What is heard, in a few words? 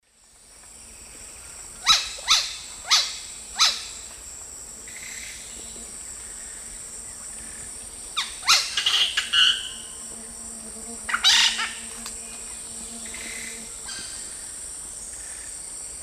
Cacicus cela, calls; S. America; Eastern Lowlands